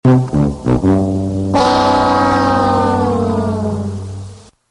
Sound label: losing horn; price is right